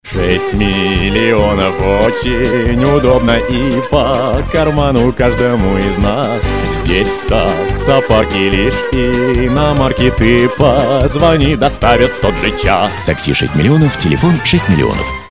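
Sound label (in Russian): вок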